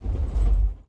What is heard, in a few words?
soft road bump; Blind Drive